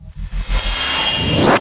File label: Weapons; Invoff